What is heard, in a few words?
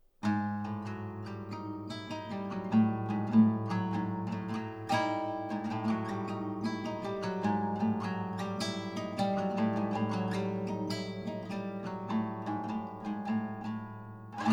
Waltz